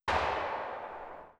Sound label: smg tail, weapons; combine; Half Life Alyx